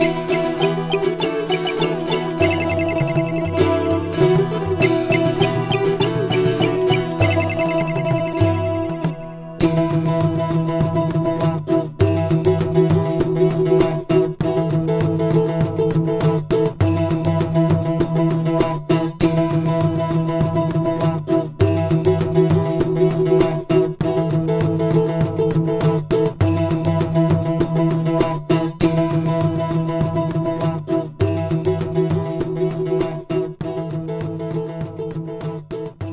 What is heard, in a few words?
bgm pot ethnic